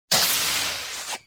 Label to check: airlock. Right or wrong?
Right